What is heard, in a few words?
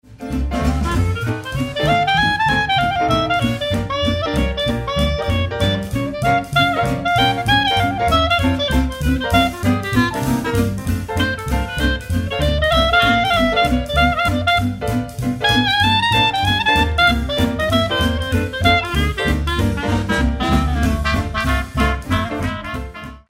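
clarinet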